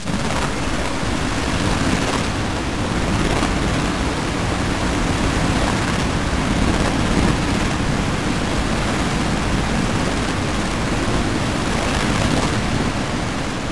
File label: ANTIMATTER, DEEP ATMOSPHERICS; Sonic Foundry (Sony Creative Software); Vir Unis Infusion